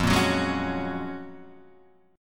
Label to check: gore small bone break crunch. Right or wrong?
Wrong